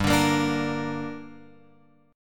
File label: G Minor G down Guitar Open F, chord; strummed; JGuitar